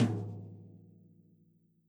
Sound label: Tom Stick Hit Soft Dry; One Shots; Drums